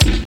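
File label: kick grunge, dance kicks; Roland L-CDX-01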